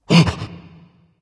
breath dragon; fafnir

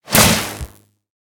land; smite; raijin

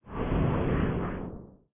float; smite; thanatos

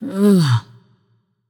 nv sigh; smite; voicepack; morgan le fay

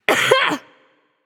clear throat; Smite; bellona

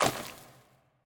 lobby defeat struggle kicked; smite; poseidon

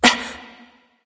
surprise; smite; voicepack; skadi

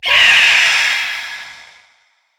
Death Screech, phoenix; smite

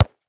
sandcastle ball; smite; god geb skin7

